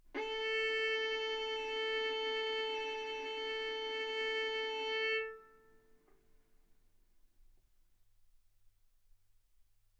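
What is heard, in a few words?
Violin arco mf A string A4 B4 stereo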